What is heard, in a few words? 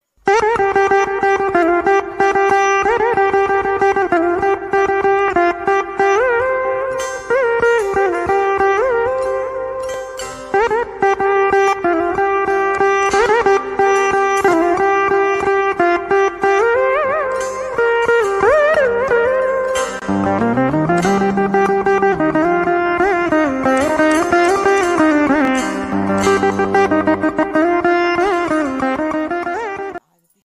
Spiritual ringtone